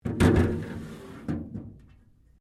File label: DRAWER METAL OPEN, Sounds onboard HMAS Castlemaine; Boats; Vehicles